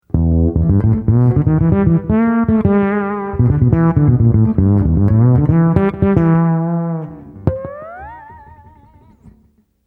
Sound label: delay reverb